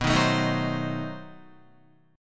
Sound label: B 7th Flat 9th B Guitar; B7b9 strummed; 6,6,9,8,6,8; JGuitar